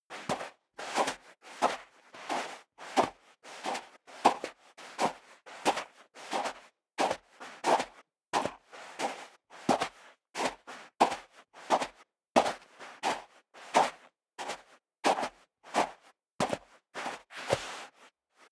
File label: step leather shoes sand walk sequence; schritte